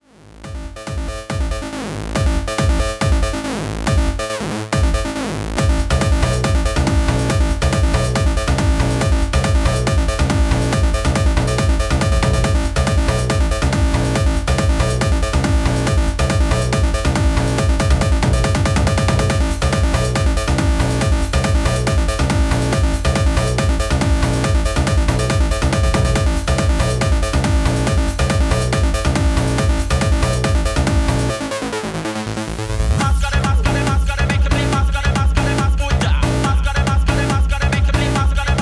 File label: Piano mix